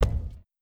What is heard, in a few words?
Step Heavy